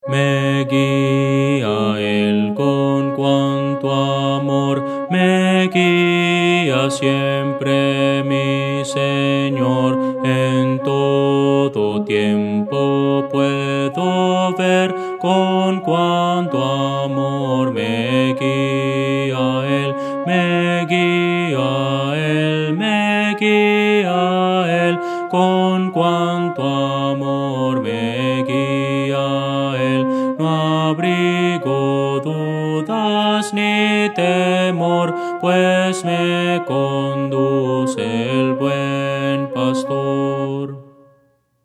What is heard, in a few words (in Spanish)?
me guia el con cuanto amor contralto